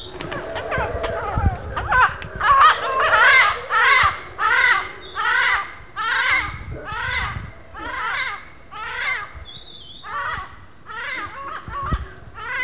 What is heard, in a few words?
yellow headed parrot